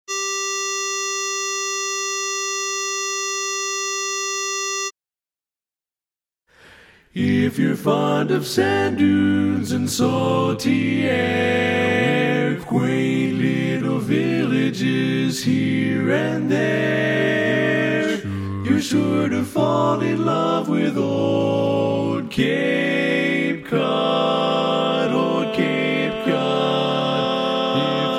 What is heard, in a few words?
Tenor